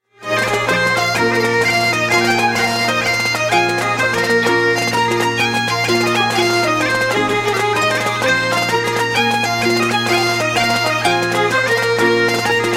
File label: jig